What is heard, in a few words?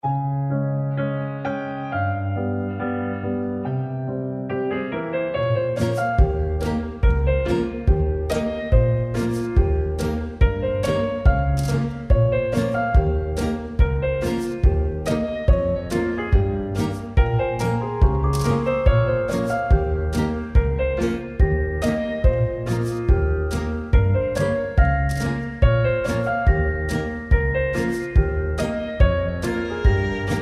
STRINGS FIELDS